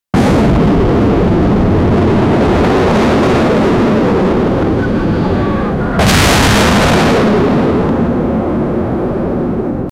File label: a machine gun ripping, flyover